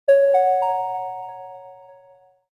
Light Sms

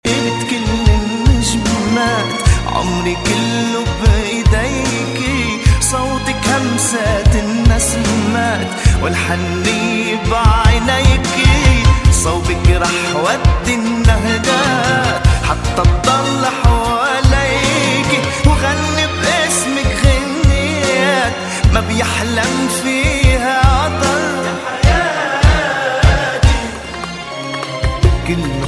Arabic Love Song

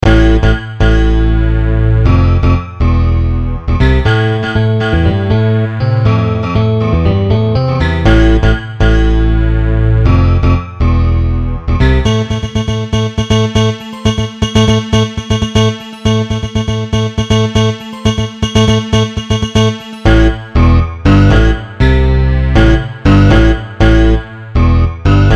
Subtle Ringtone

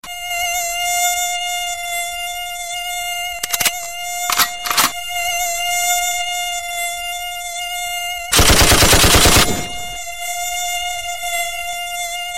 Irritating Mosquito

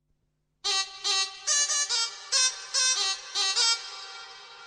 Cute Msg Tone

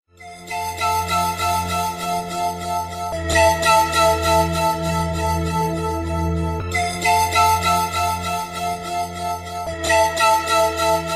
Sweet Sms

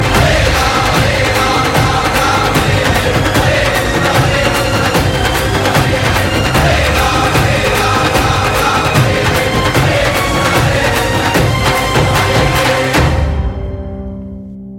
Chant; Agneepath

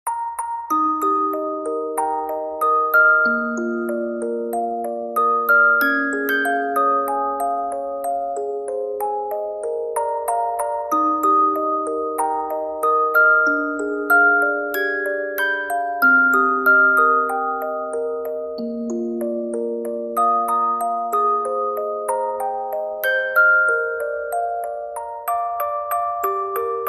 Music Box; One Piece

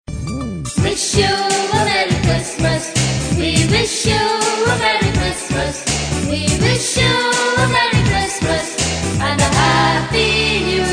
Christmas Song

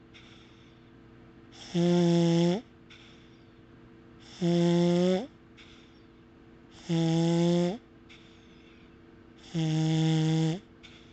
snoring

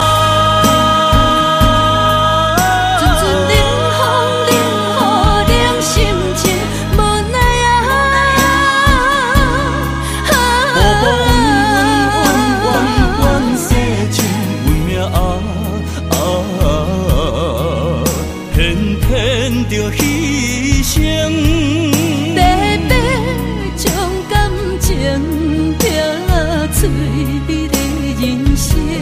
Hokkien Song

